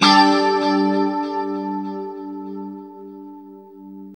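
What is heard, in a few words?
Chord G 4; Guitars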